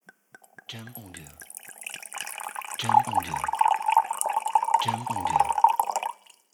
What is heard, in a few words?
Pouring Wine